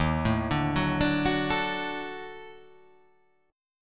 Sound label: D sharp Minor Major 7th Flat 5th